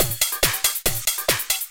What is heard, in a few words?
Beats, Synchro Funk; Tekbase; Sonic Foundry (Sony Creative Software)